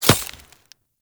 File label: Throwing Knives Impact Vegetation; Weapon Impacts; Player; Environment